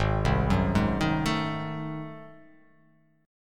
G sharp 7th Flat 9th G sharp down, chord

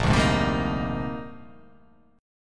Bb 13th; Bb13 strummed

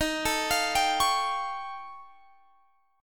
Eb 9th Flat 5th, Guitar, Standard; Eb9b5; arpeggiated; x,18,19,22,20,21; JGuitar

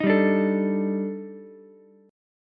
D sharp Major Sharp 11th, Ukulele, C6; strummed; 8,7,10,12; JGuitar

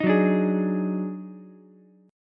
D sharp Minor Major 11th D sharp, Ukulele; strummed

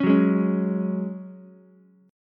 C sharp Suspended 4th C sharp down Ukulele; strummed; JGuitar

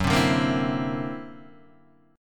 D Augmented 9th F sharp, guitar

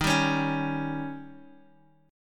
D sharp 7th D sharp, Guitar DADGAD

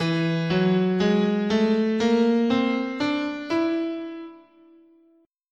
E Major Locrian, scale

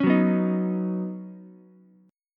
Eb Minor 7th Sharp 5th, down, Ukulele; strummed; chord; JGuitar

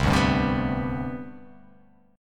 C Major 7th Suspended 2nd C down, chord; CM7sus2 strummed; JGuitar

